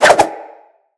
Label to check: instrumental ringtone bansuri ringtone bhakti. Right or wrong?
Wrong